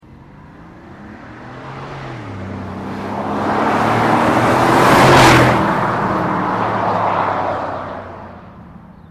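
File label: Porsche Cayenne engine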